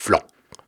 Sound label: Syllables and Imaginary Words, Viking Vocal, Hard